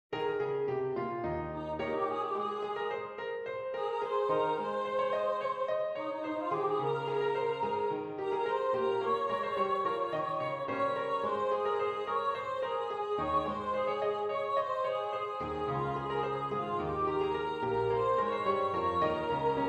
PIANO

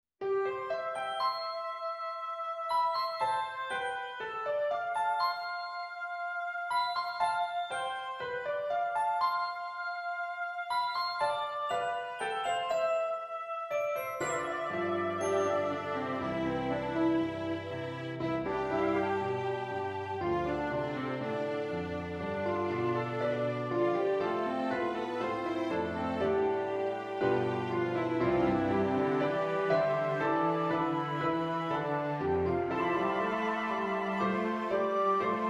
Prelude ORCH; Act Three

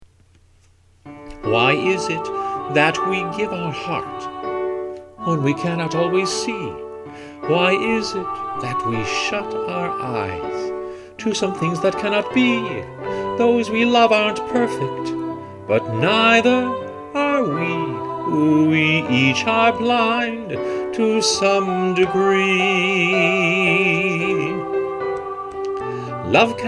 VOCAL

